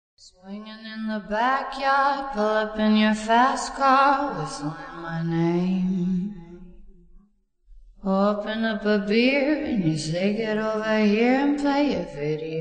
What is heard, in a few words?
Acapella